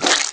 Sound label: squirt; Massive Cleavage vs. Zombies Awesome Edition